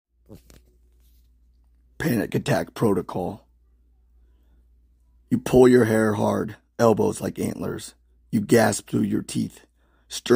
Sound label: Panic Attack